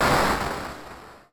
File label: burst